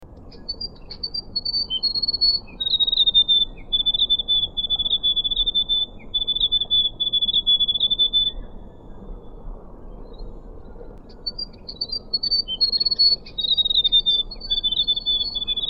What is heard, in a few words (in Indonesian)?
suara remetuk kelabu